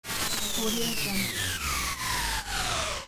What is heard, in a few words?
scifi turbine shutdown; stormwaveaudio